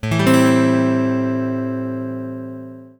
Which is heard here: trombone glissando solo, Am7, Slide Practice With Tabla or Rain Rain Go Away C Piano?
Am7